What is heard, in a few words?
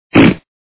splat